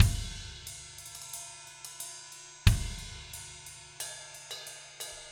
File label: 090 Alt Rock Drums Verse; Pop Rock; Moderate Tempo; Pro Tools Essential Loops; Digidesign